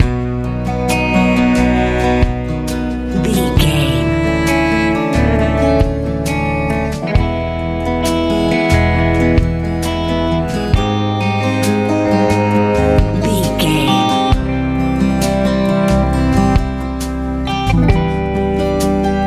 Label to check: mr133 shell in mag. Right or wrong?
Wrong